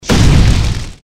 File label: fire gecko flameburst; npc